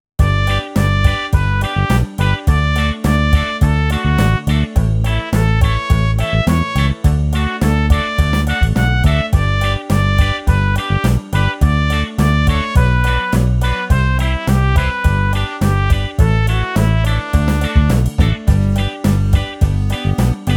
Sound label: Karaoke